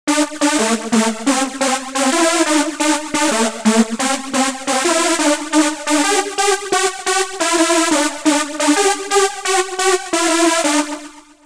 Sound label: Horripil Dance Lead